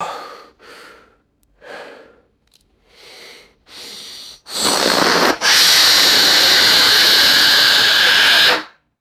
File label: blowing up a squeaky rubber balloon